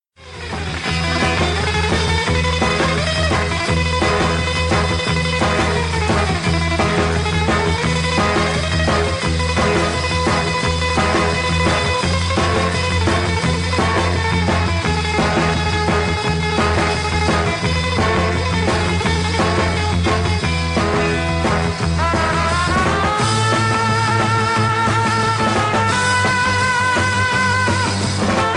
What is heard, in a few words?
ringtone